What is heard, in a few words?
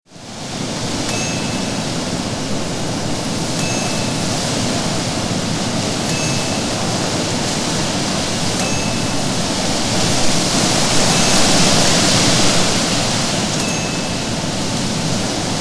Wind Blowing Through Trees